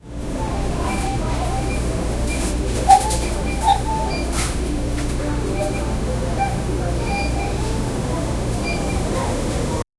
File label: sounds of a grocery store